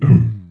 mummy damage

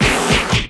hogogong strike; spirit handler

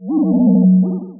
powdering

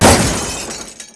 frozen explosion

